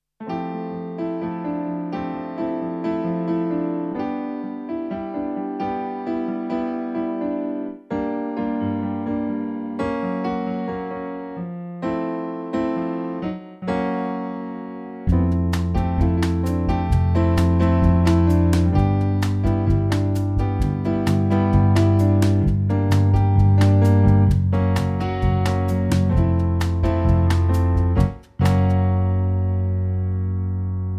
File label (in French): Piano Rythmique; akai apc mini